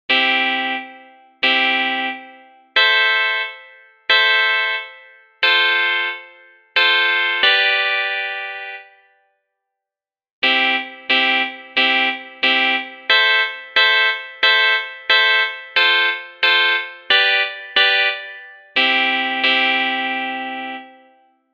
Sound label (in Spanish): GUITARRA ELECTRICA; Audio da guitarra eléctrica